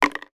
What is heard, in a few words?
toy doll leg drop on table; barbie